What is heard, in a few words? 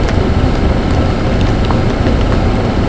drill; lmctf